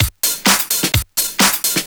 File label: Straight Loop 128 BPM, House